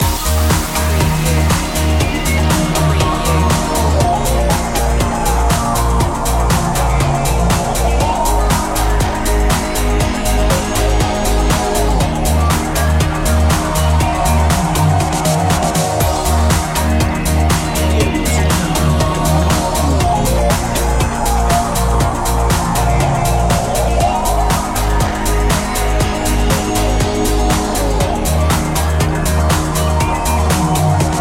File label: soft techno pulse